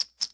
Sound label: sound Bet